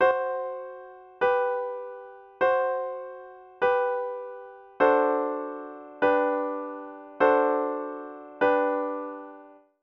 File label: Secondary dominant tritone resolved